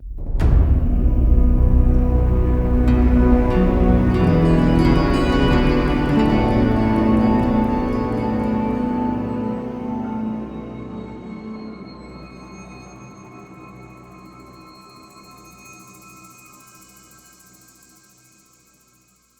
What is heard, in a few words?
Cave Cue, Music; Duviri Spawn; Warframe; Stingers